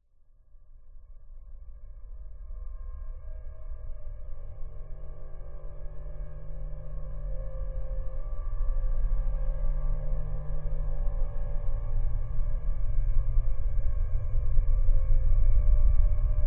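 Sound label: science fiction forcefield energy source think dense powerful rumbling dark eerie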